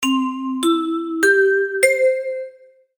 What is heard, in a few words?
chime; maoudamashii